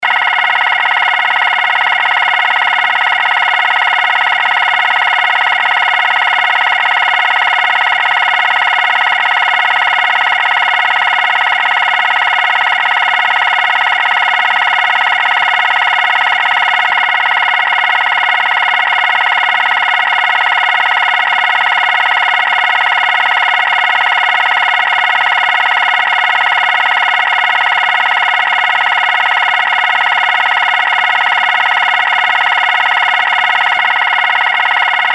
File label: scanner loop